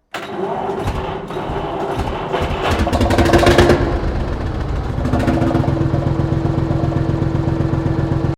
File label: Tractor Lance Bulldog Model Q Startup, Old Farm Tractor; 1954; Tractors; Vehicles